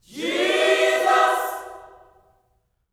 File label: JESUS CHD, Vocal Gospel; Spectrasonics; Vocal Planet Vol.1 Gospel; akai